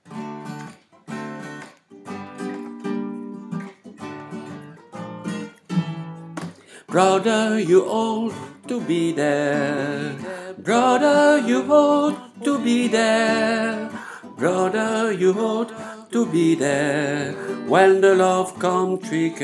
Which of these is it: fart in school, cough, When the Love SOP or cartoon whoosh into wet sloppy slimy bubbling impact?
When the Love SOP